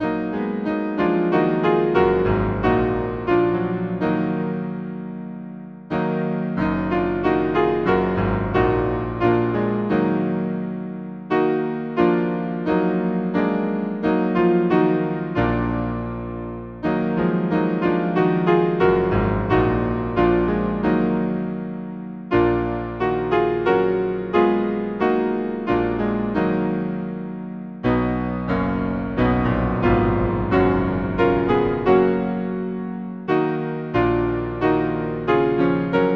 piano, Hymn; Holy Spirit Lord of love; Aberystwyth

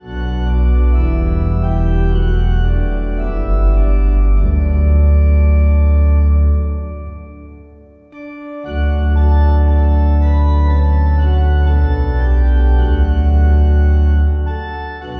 Hymn organ nunc sancte nobis spiritus; Hymnal 1982

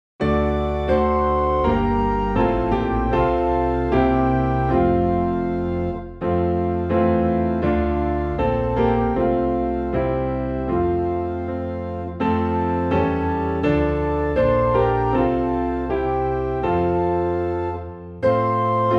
Piano and Organ in F; Christ Whose Glory Fills the Skies; Hymnal 1982

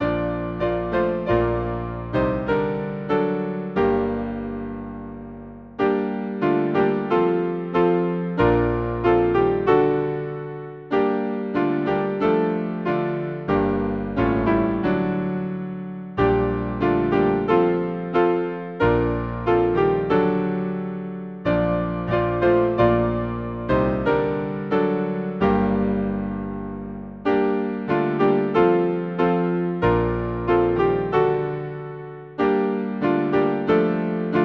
Hymn, piano; Kind Maker of the world; A la venue de Noel

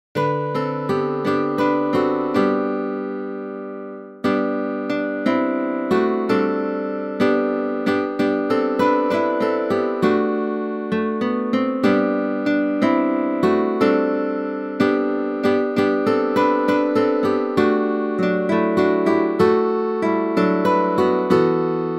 guitar AgnusDei; Hymnal 1982

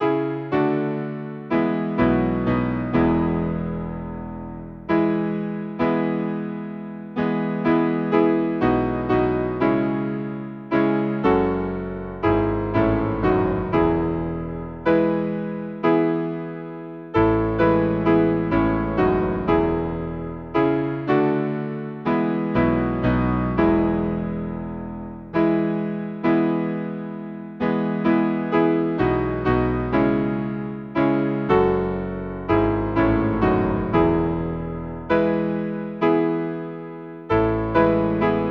Hymn, piano; O God unseen yet ever near; St Flavian

